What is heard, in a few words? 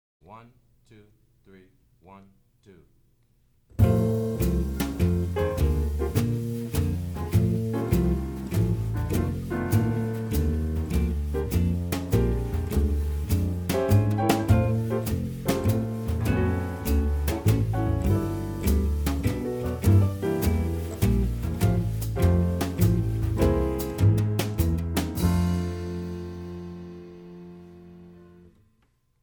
F 3 4 Blues Guitar Piano Bass Drums